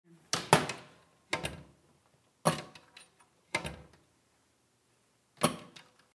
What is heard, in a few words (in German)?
Schublade klein